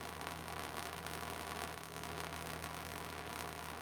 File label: shard